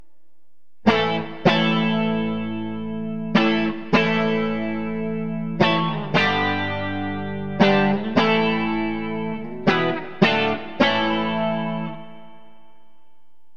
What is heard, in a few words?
clean; bridge; Burny